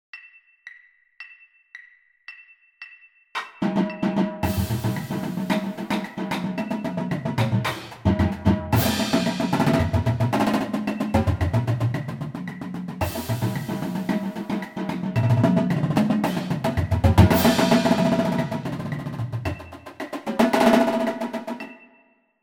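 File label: battery simplified percussion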